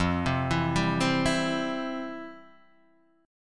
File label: F Major 7th Suspended 2nd, F, down, guitar